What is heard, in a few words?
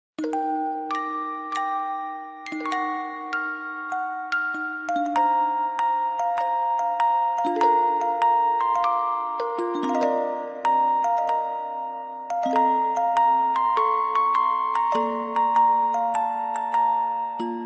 music box ver.